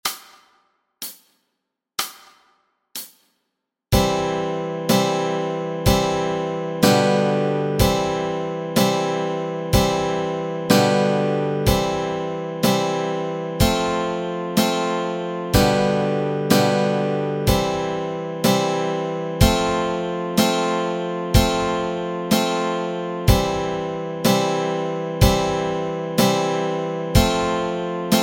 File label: Yankee Doodle chords, Easy Guitar and Ukulele